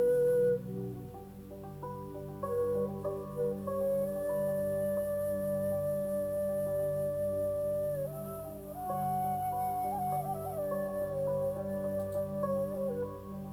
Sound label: flute